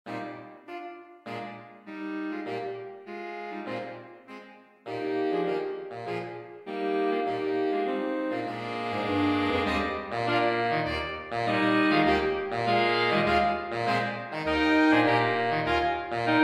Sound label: saxophone quartet